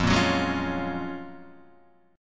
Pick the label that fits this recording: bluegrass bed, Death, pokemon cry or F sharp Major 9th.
F sharp Major 9th